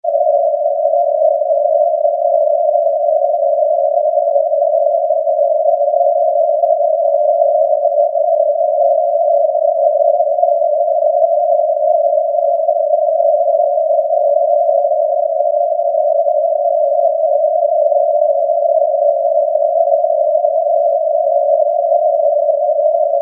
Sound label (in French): bruit rose